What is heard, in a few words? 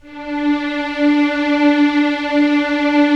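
Strings Violins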